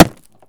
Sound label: plastic; Escape from Tarkov